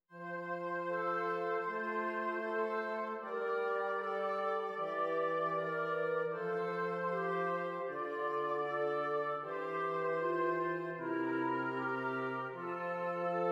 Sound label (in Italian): esercizi di contrappunto semplice A tre voci; Ronge